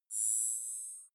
zoom in long

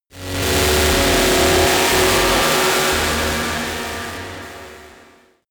sci-fi cosmic dark energy disturbance; horror

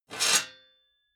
metal sheet scrape; metall

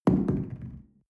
shell place on tablecloth wobble medium; soundpool

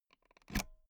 pull out euro plug hard; objekte; elektronik

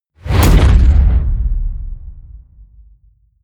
wham, whooshes

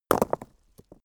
stone hit stone; natur-tiere; steine

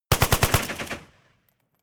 rifle ak-47 exterior fire burst reflection; Firearms; schusswaffen

